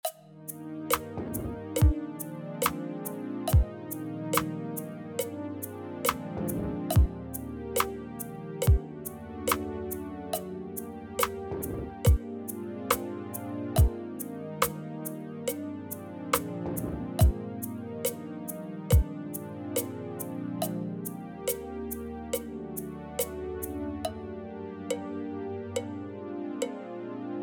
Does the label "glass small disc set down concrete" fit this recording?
No